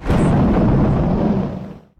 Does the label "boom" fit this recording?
Yes